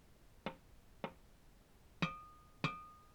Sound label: finger, horn